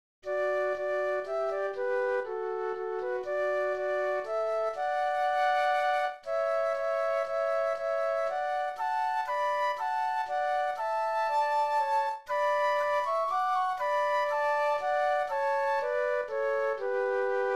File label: Flute Duet